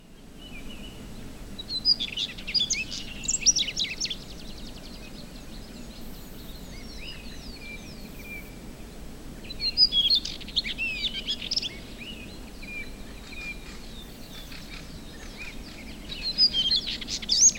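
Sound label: Ph auroreus song, background Turduss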